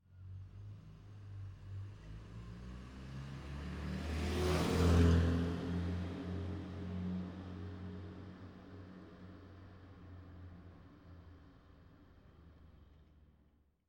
pkw golf faehrt vorbei; geraeusche digital

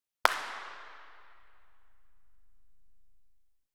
haendeklatschen; geraeusche digital

